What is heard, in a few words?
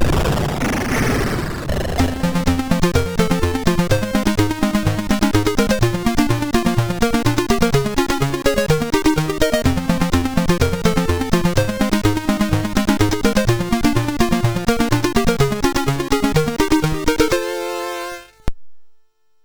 sid MOS6581R2